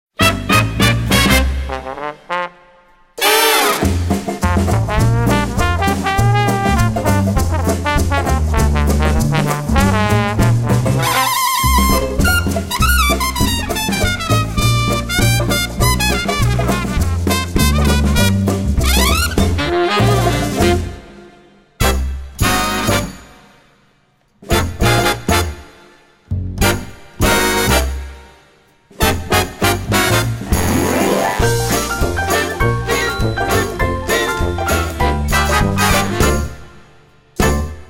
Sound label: Instrumental